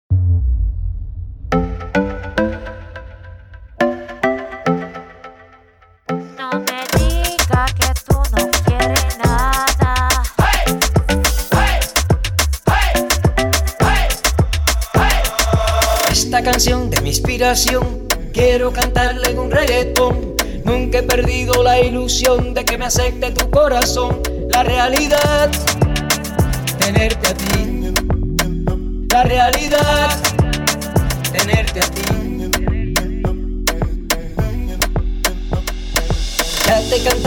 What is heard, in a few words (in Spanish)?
reggaeton; FESTIVEGAS 2020